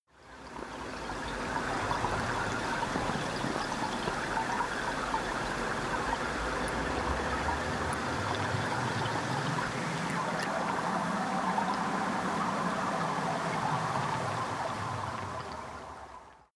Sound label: water fx reso